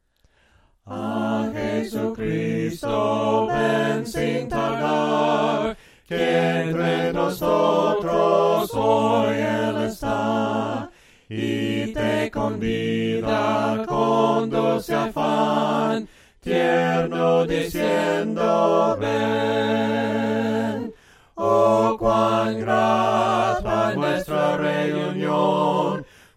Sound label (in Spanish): himnos a capella; cantos del camino